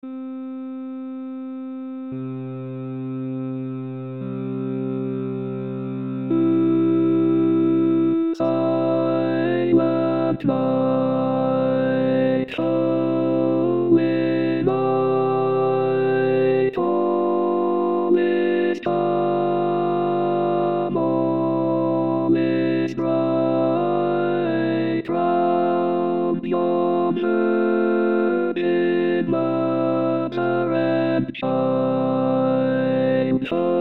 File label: tenor